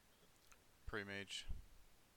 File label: pray; mage